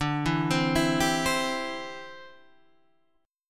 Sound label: D 7th Suspended 2nd Suspended 4th D